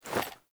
Foley Action Melee Swipe; Characters; Player

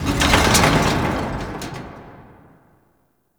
Metal Glass Rattle; Ambience; Props; Warframe; Entrati

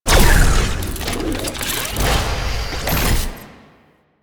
Shrine End, Medusa Puzzle; Duviri

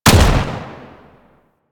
Pistol Shot; Grn Kohm Pistol; Warframe; Weapons; Grineer

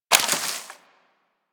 Bullet Impact Debris Wood

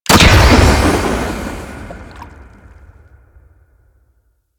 Mine Drone Underwater Explosion

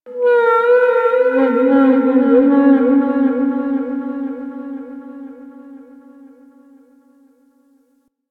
Ocean Whale Bellow; Ambience; Warframe; Grineer Ocean

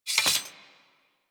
Investigate Putdown Sword; Warframe; Cephalon Weave

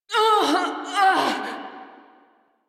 Death, Female; Railjack Crew; New Loka

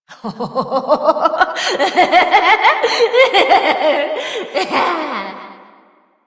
Evil Twin Doppelganger Laughter; Warframe; Entrati

